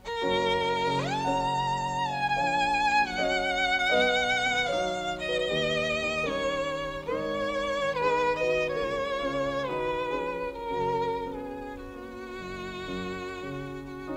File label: Humoresque